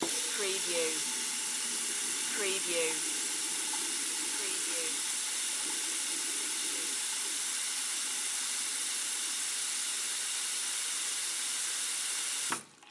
Sink Faucet On Off; sound effects bible

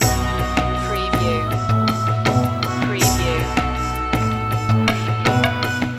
indias charm loop

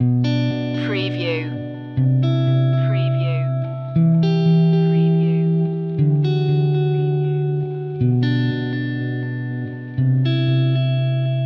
minimal guitar

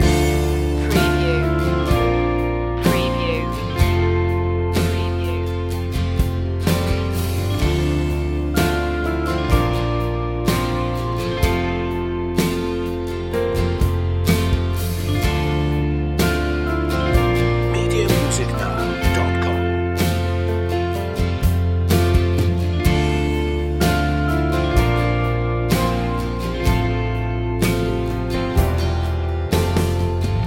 crashing waves loop

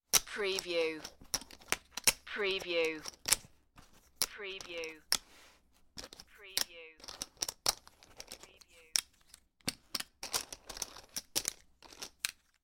Poker Chips Collecting from Table

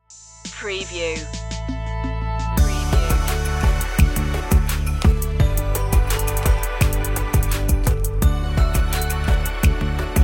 kids hip hop

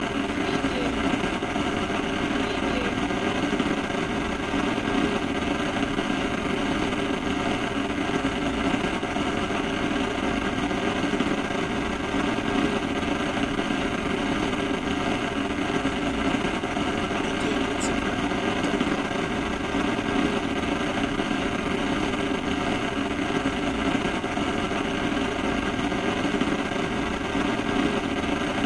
Television Static

